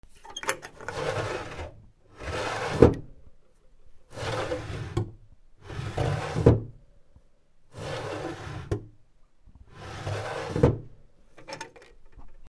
引き戸, 『シューシュー』; K｜フォーリー(開閉); K05 ｜ドア(扉)

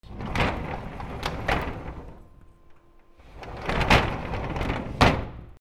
引き戸, 『シュルル』; K｜フォーリー(開閉); K05 ｜ドア(扉)

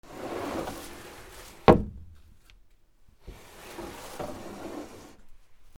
引き戸, 『シュートン』; K｜フォーリー(開閉); K05 ｜ドア(扉)

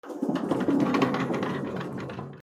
引き戸 こっそり; K｜フォーリー(開閉); K05 ｜ドア(扉)

